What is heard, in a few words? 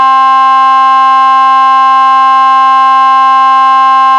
horn, sirens; federal sig 650